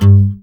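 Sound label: GUI Ac Dmp G, acoustic guitar; Best Service Gigapack 2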